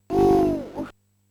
"Uuuuh!"; Michael Jackson's Moonwalker Genesis soundtrack

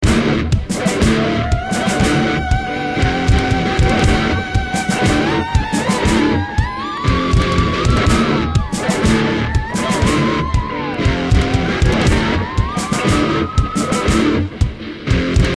good guitar; Strong Bad's Cool Game for Attractive People

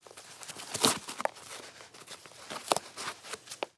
Tie Rope

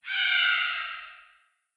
vultures solo; adams venture origins

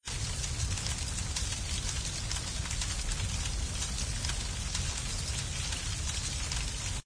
Wood Burning

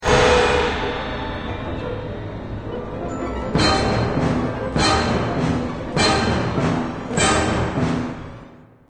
Shock; Doctor Who; Adventure Games; Episode 1

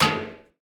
Death Ax; portal quest android

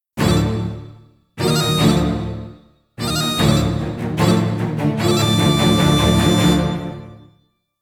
Combat In; Skellige

